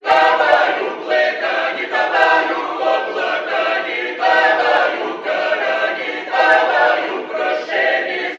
voice choir in another language, Loops, Sad

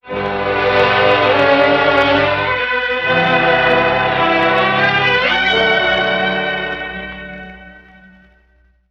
strings, sad

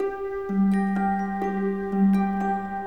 strings; Loops; Sad

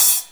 Hats Real Open

